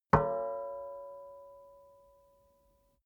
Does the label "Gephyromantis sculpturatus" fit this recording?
No